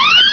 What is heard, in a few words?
mouse hit